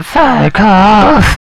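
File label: vocals, Jungle; E-Lab; XTC Files of Jungle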